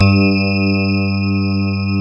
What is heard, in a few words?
GRAND PF G 3, AKAI S-Series